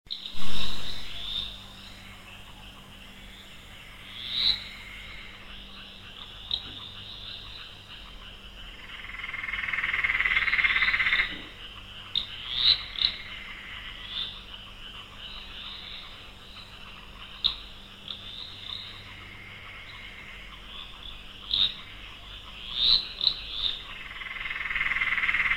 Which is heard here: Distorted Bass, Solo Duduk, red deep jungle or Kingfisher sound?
Kingfisher sound